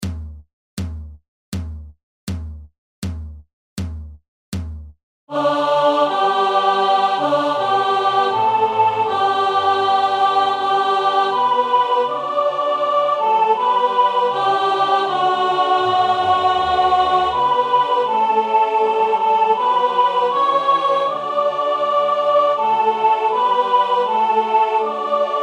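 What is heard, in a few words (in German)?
Sop, Raetoromanische Volkslieder; Fontaunas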